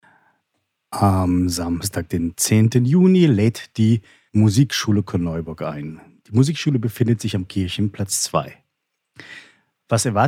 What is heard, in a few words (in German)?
Vorspielvormittag Klarinette der Musikschule; Musikschule Korneuburg